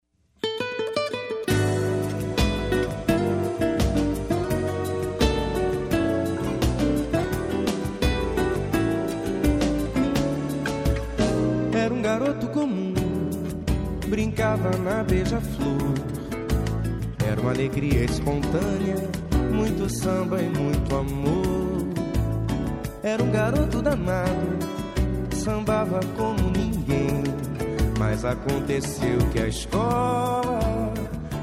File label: Samba perdido